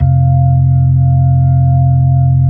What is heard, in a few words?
B-3, Brite; Keyboards of The 60's and 70's